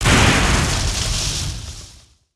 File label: lightning effect; Factorio